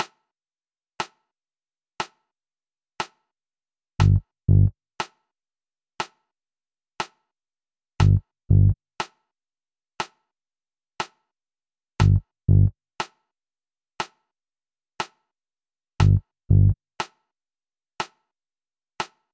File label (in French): Précision Rythmique Temps 1 60 Bpm